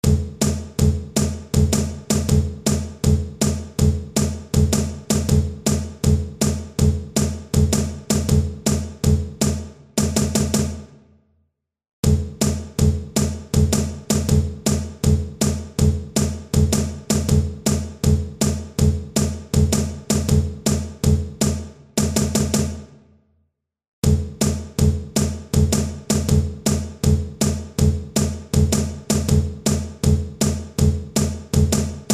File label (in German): ZWEI CAJONES break; stueck fuer 2 cajones